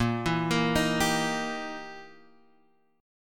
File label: E Minor Major 7th Flat 5th Bb